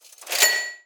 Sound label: cash register